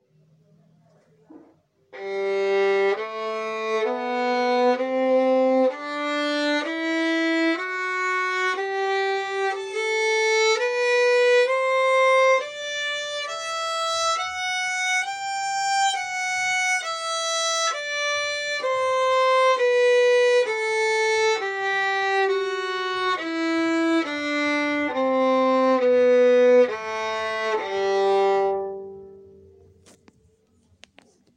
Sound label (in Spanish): Escala de sol mayor